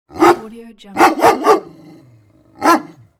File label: dog barking